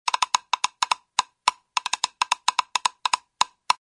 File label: wood blocks quick puppet dance